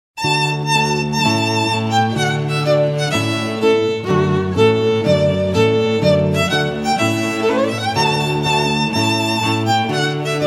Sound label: trumpet tune trio of strings